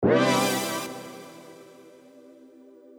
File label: Stab